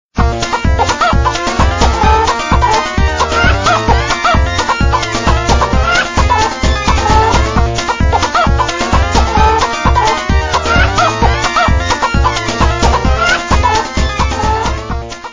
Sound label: Country Chicken